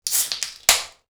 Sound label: can open